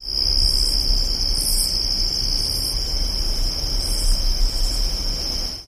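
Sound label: Crickets Loop Distant Road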